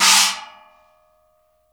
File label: CHOKE, Drumworks; Sampleheads - New York City Drumworks VOL-1; SW KITS